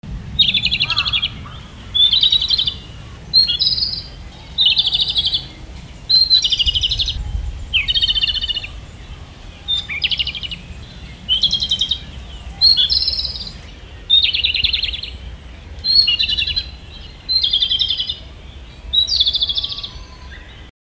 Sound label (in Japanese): komadori